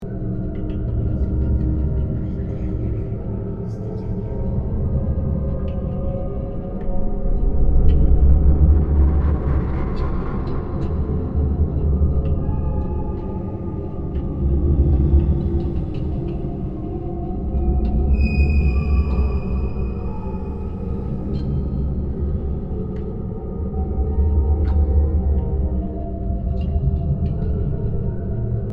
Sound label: Ancient catacombs ambience tension loop